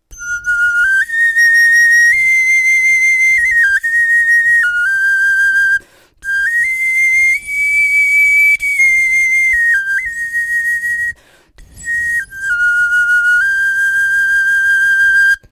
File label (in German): kerbe, knochenfloeten